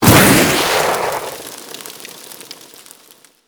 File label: Fire Damage Hit; Warframe